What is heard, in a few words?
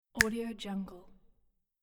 finger snap